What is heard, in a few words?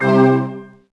task complete; msntv2